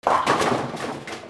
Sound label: GAMES